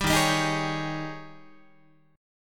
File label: D sharp 11th D sharp, Guitar; strummed; JGuitar